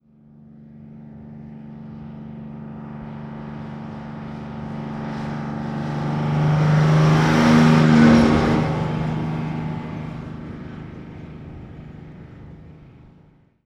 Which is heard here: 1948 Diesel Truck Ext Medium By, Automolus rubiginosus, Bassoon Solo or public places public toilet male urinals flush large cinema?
1948 Diesel Truck Ext Medium By